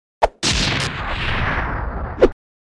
Important Item; Sly Cooper 3 Honor Among Thieves

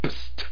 Voice - Beat Box

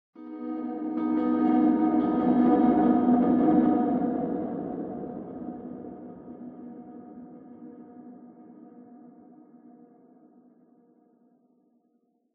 Piano Disaster

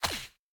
Spawnin Land; Boomerang Fu

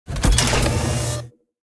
Rocket Gate; Tank Stars; android

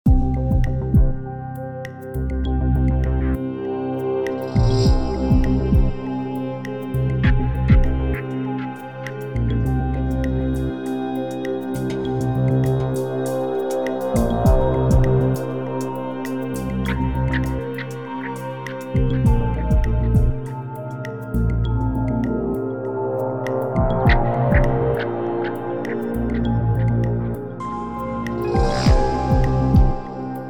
Tension Loop; Killer Frequency; PS4 PS5 Switch Windows Xbox One Xbox Series X|S

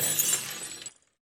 Orb Break

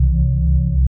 Ambient Shield Room; Among Us

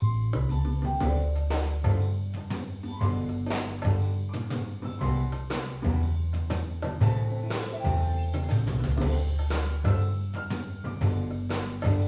Karaoke Jazz; the sims 4 city living

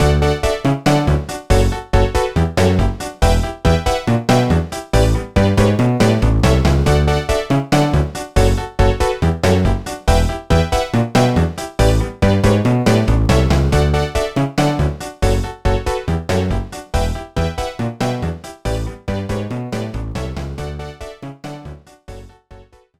BGM Reading; simple ds series vol. 36 alc de mi ni tsuku toeic test bunpou tokkun hen 2008; nds

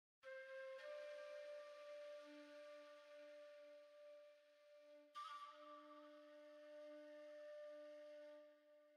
Flute

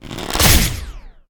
Archer Chaos; Inotia 3

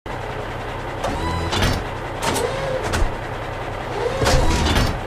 T34 Idle

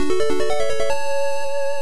Fanfare (Level Up); fire emblem gaiden family computer

